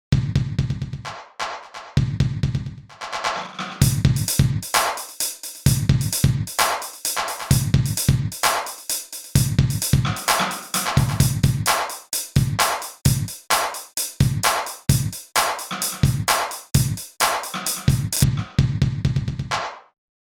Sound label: rock box 130 bpm